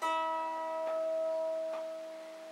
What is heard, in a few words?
lyre